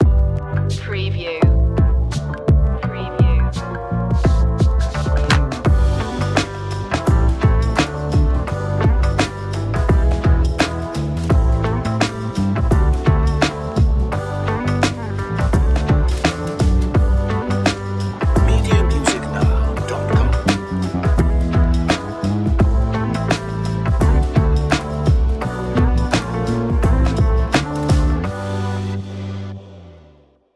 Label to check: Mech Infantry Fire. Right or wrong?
Wrong